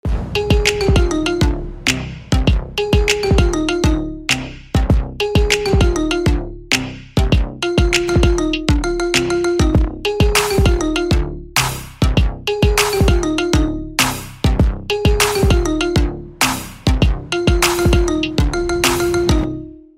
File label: iPhone, ringtones; iPhone-12